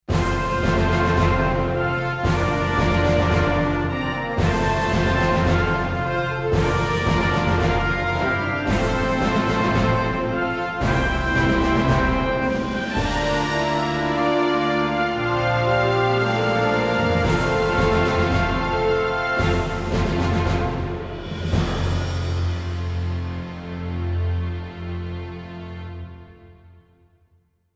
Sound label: Minor Win; battlestations midway